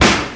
sizzly acoustic snare sample b key

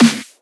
full acoustic snare sound a sharp key

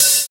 shiny open high hat sound f sharp key

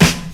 good snare sample a sharp key

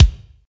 steel kick drum c sharp key

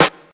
prominent snare drum sound D# key

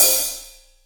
crispy open hi hat sound a key

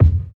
puffy kick single hit f key; kick drum samples

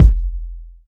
rich low end bass drum sample f key; kick drum samples

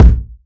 tight low end kick single hit b key; tuned drums

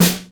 rich top end snare one shot f sharp key

